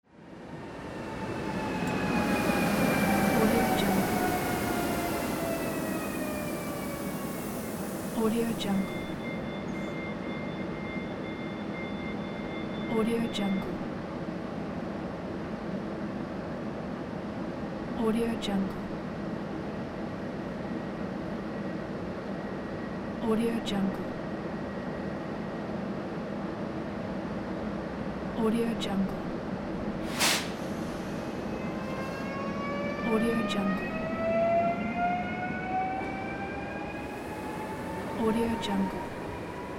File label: train arrives and departs